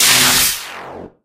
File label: shock; glados potados; Portal 2; Source Engine; video games; scripted sequences